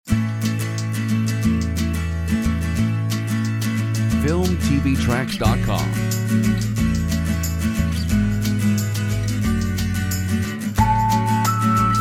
Happy Ukulele

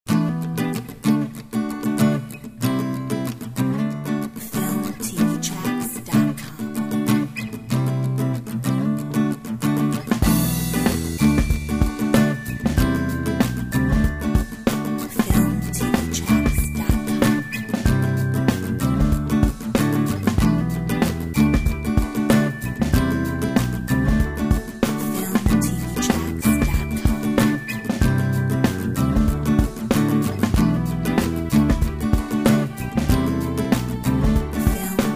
Cool groove

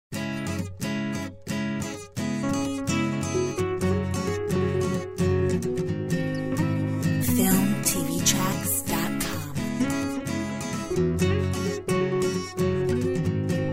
Acoustic song

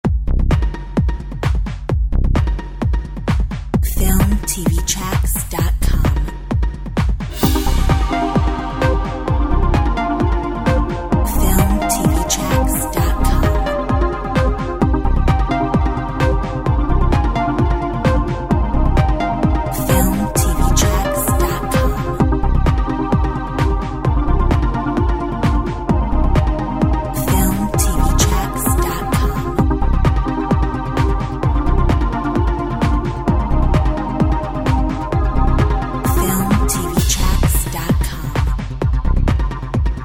techno

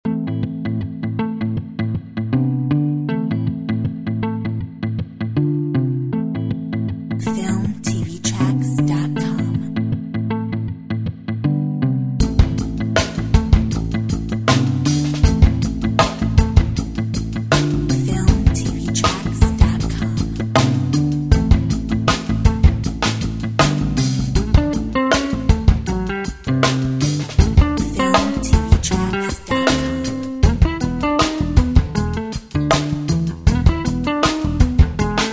Funk

